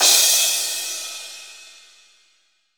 big room crash e key, Royality free drum crash sound tuned to the E note. Loudest frequency: 6331Hz; Cymbal; drum sample; one shot